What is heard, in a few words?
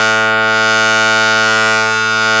SYN GUISYN; Sound & Vision - Gigapack II CD 1